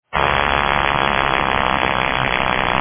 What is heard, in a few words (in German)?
Wecker; jlc